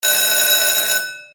RING SHORT; Alchemists Secret

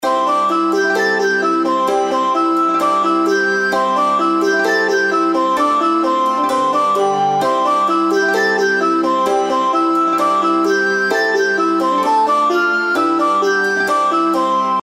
intro bgm; Journey To West

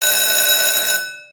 RING SHORT; Ultra Sevens

